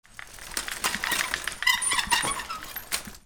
DOORS

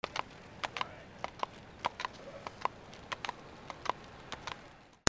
ANIMALS

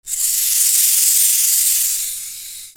TOYS

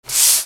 PAPER

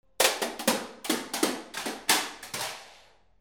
FALLING

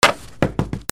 OFFICE

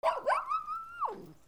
DOGS

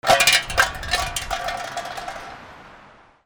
HIGHWAY

